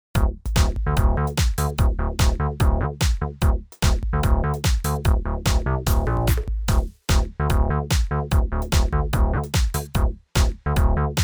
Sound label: Tierra Audio Gravity VCA groove dry